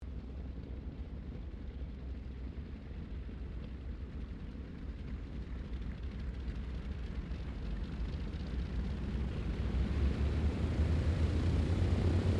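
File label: AIRCRAFT LOCKHEED L-1049 SUPER CONSTELLATION TAXI; 1955; Connie, Super Constellation; Airplanes (Sound Effects:Vehicles)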